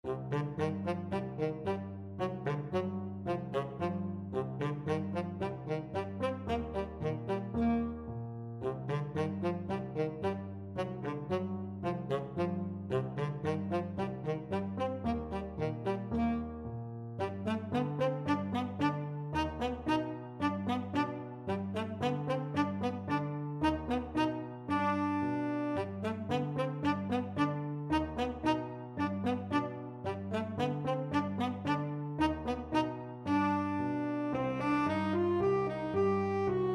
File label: tenor saxophone